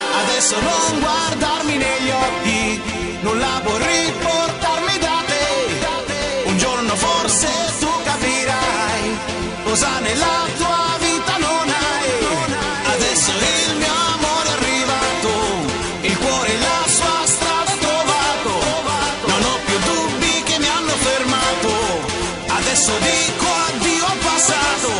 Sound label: best vocal ever